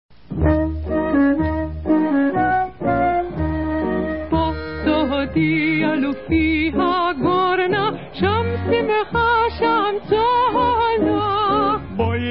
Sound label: VOCAL; Gurit Kadman